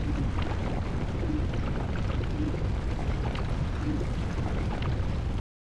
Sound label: vlocano effect, ambience